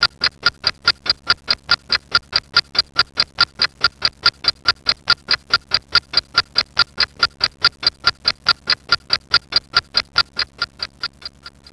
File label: Tick; WAV Sound Effects; houshold; clokphon